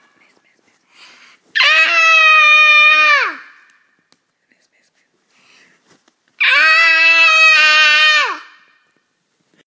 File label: Baby Scream